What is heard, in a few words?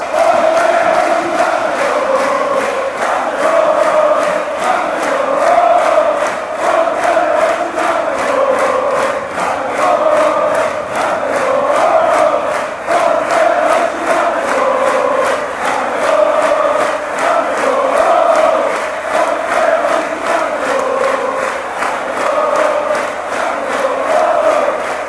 czech republic chant